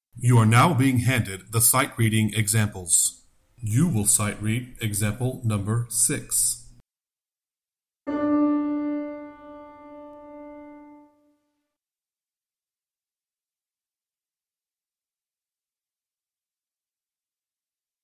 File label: Sightreading Example Soprano